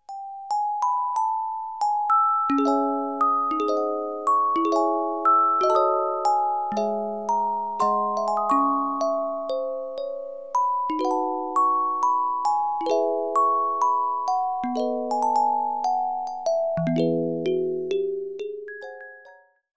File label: Carillon Music Box Melodies